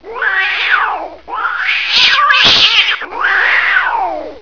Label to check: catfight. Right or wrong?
Right